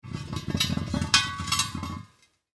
Wheel Rim Roll, Wheel Rim Roll Metal Auto Mechanic; Auto Shop; Foley; Auto Shops; Blastwave